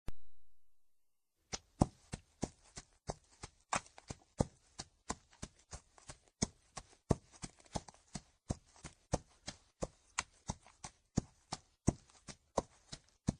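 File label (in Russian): лошадь рысью на траве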